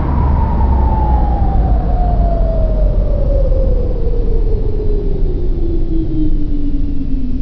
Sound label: mechwhine stop; ambience